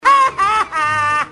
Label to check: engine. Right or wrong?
Wrong